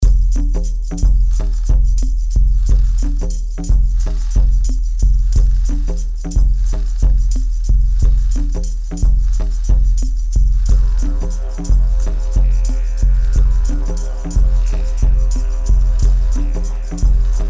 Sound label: Dawn of the Didge; Noisebiscuits